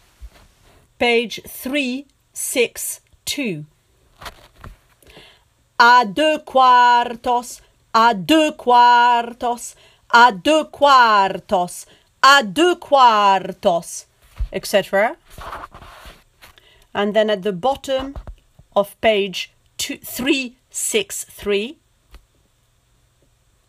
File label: bas; Carmen